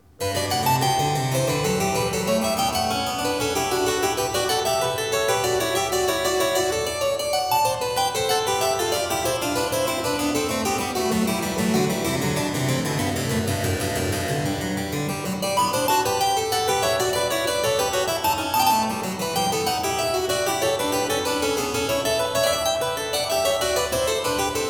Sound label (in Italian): Variatio 17 a 2 Clav.; BACH; GOLDBERG VARIATIONS